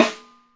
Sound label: complex live snare; single hits